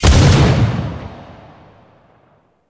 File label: Melee Slam Hit; Warframe; weapons